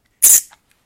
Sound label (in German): vögelchen; ZOOM